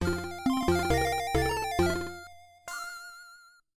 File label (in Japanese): ジングル; Fray; PC-9801